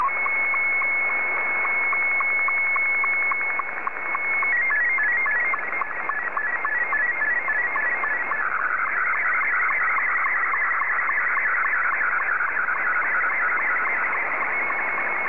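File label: Scottie 2, slow-scan television; ZL2BMH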